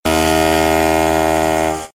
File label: alarm